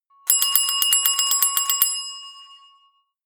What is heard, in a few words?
notification bell